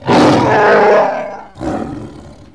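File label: Wookiee male attack; Star Wars Knights Of The Old Republic I; XBOX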